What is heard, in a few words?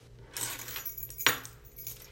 grabbing keys; Record some "everyday" sounds, process/transform/arrange them, creating a composition